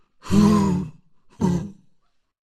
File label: Thor Death Vocals